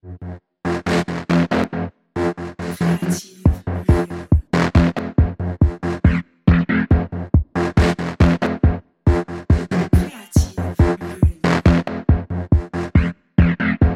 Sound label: lo-fi indus